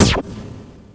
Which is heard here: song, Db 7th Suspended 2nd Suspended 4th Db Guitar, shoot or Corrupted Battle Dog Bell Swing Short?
shoot